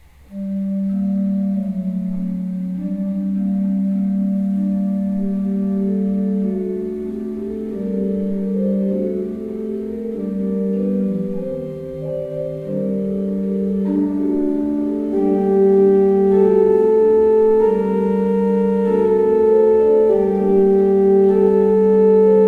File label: pipe organ; Kleuker 15 II P